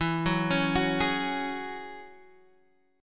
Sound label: G sharp 7th Suspended 4th Sharp 5th